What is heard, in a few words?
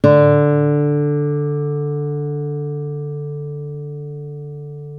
ACC FG D, Acoustic Guitar; East West; Steve Stevens Guitar Samples Collection